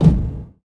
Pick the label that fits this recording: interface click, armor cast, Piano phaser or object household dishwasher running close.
interface click